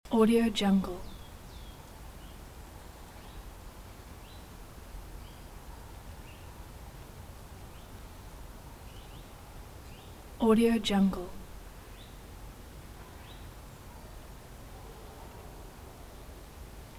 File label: quiet woods with light wind at august loop; جنگل آرام در اواخر تاب; nature sounds